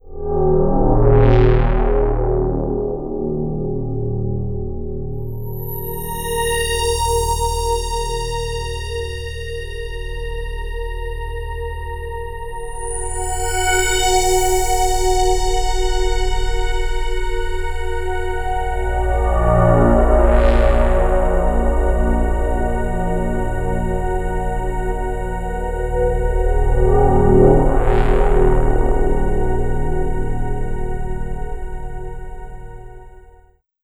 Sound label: Open Space Atmosphere; Atmospheres; Sonic Foundry; Slow Silhouette